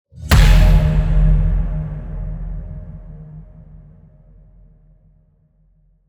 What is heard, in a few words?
Cinematic Hit; SoundBits; Cinematic Hits Transitions